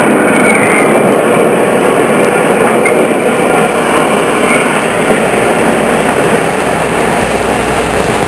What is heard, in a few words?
Train Passing with Squeaky Rail; Vehicles; Soundsation